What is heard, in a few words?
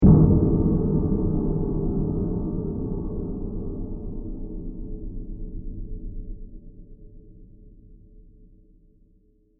Dark Mysterious Hit; Miscellaneous Impact; Impacts; Sound Effects:Impacts; CraftedSignals